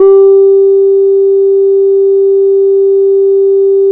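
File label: CELESTE, percussion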